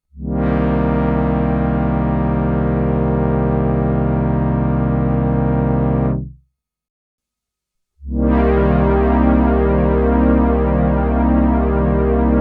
Juno-106 Original

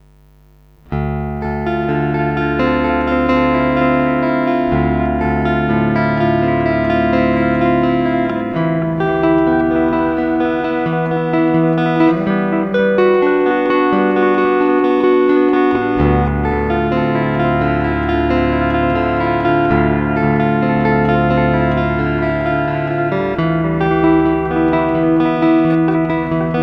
delay

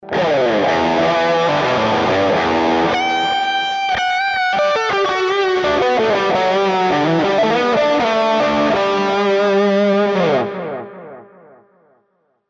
Emotional Solo; GTRS

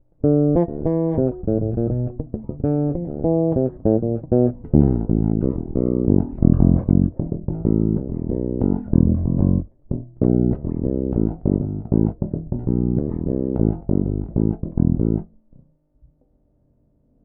Bridge passive rolloff

